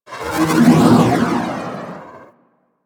Shield Random; DE; Warframe; Weapons; Archwing; Powers

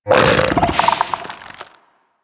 Bug Vocal Tranquillized Grunt; Warframe; Hunting Critters; Inf K Drive Bug

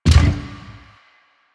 Gear Shift Down, Motorcycle; Motorcycle Ninety Nine

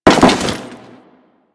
Crewman Land Default, Footsteps; Warframe; Corpus

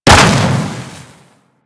Pulse Exp Impact Default; Warframe

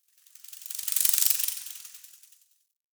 Cave Sprout Insect Close; Midrath Island

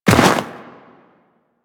Walk Snow, Footsteps; Warframe; Tenno

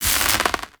Tempest Tesla Cannon Impact Enemy; Weapon Impacts; Player

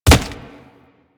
Infested Charger Step Default, Footsteps; Warframe

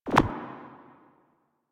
Corrupted Notes S; Warframe; Bard Quest; Bard Boss Fight

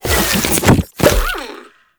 Reroot Cast; Warframe; Nokko

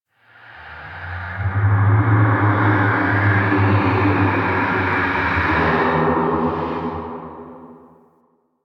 Ghostly Whoosh; Warframe; Man In The Wall

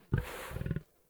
Wolf Idle Grunt; Animals; Vocals

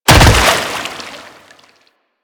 Land Water; Warframe; Infested; Ancient; Footsteps

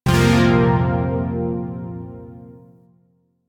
Shawzin Hirajoshi Chord; Zariman Shawzin

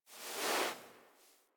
Foley Idle Sit; Enemies; Kubrow; Warframe

